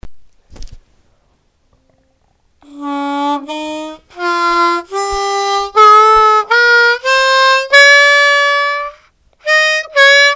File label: Harmonica Sikah